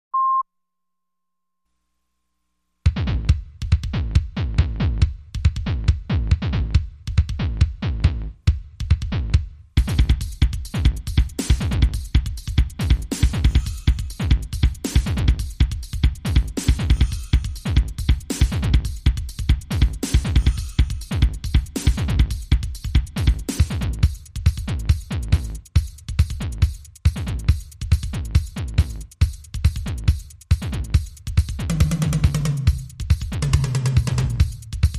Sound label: Drums & Percussion